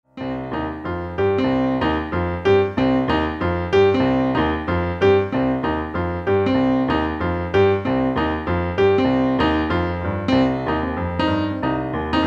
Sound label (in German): Pianoversion